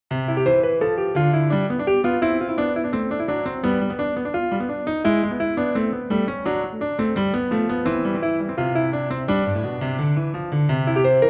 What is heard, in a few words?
Etude In C Major